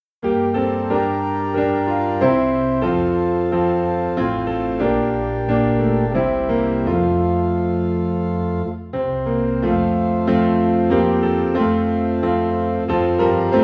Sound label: Away in a Manger, Piano and Organ in F; christmas; Away in a Manger (Alternate)